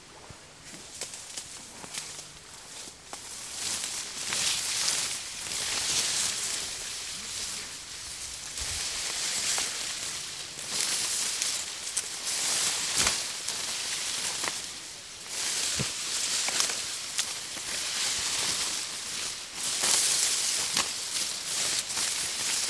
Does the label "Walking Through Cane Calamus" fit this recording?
Yes